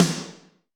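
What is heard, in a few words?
Snr Bad Punch, snare; Alesis D4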